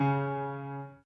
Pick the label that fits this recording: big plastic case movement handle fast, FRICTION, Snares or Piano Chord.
Piano Chord